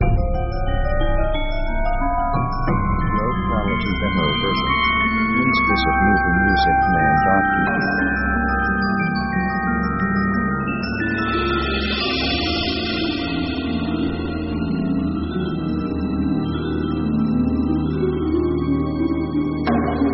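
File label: orchestral dark 70s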